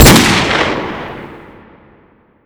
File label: desert eagle, weapons; Modern Warfare